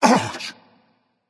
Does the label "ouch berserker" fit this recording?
Yes